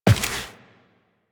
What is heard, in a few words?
footsteps walk; Warframe; Tenno